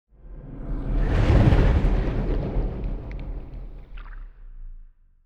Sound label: colossus move; aquatics